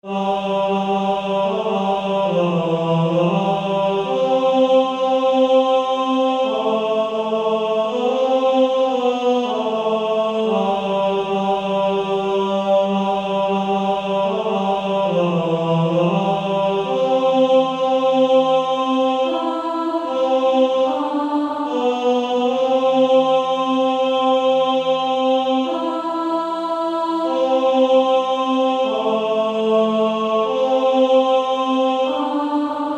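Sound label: Male Choir, tenor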